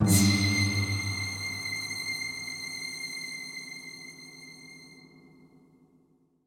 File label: trap rockfall; Broken Sword The Sleeping Dragon